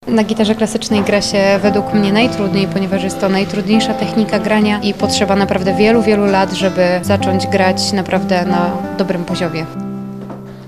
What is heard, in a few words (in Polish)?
gitara; na kresach